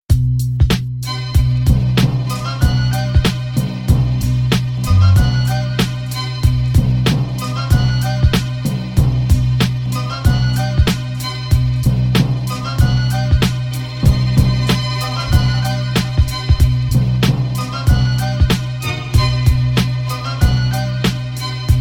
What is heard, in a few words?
beat